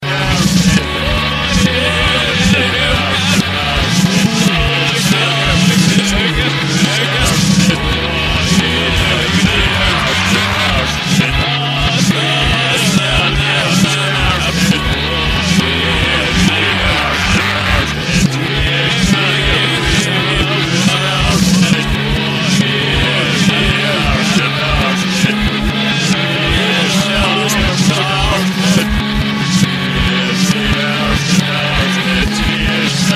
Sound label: reversed